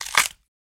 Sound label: Bone Crab Shell Break